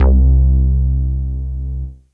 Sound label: BASS